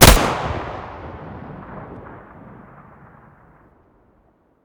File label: mg muzzle mono distant, weapons stationar